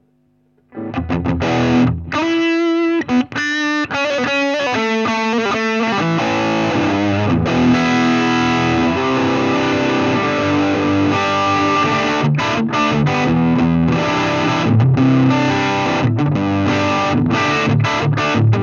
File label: higain